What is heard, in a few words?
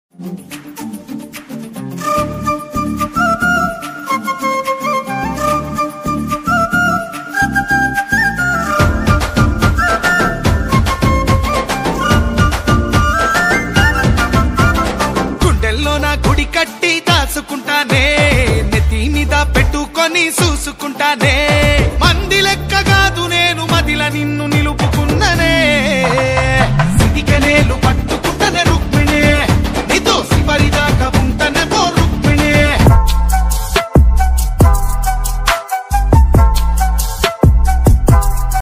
folk song ringtone